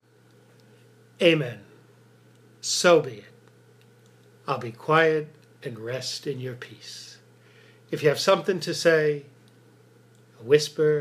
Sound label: AMEN